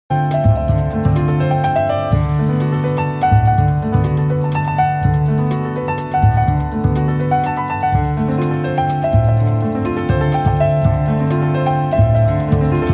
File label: happy